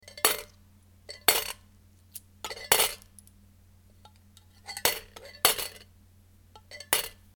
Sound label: coins dropped in metallic moneybox